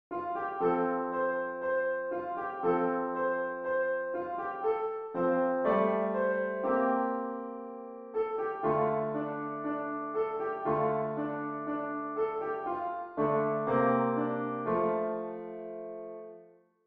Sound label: piano